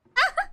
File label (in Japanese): あはっ; 壺